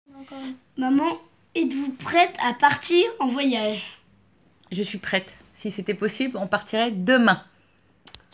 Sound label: Vocal